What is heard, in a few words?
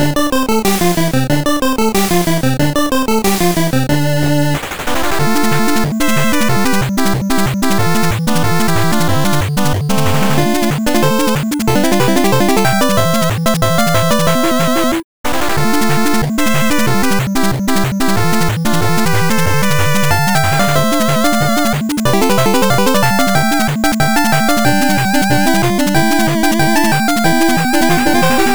8bit action boss battle climax bpm185